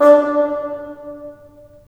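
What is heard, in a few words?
wind bassoon; LA Composer vol. 3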